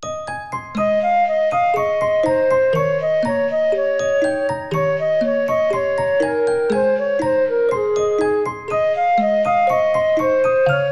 Kite; peppa pig world adventures

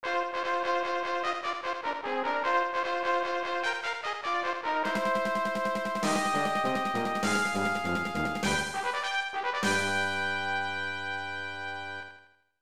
Fanfare; Turf Memories; snes

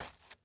dirt step

